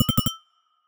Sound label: Mouse, notifications